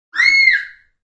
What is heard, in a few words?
Child Scream, Child screams.; Screaming; Voice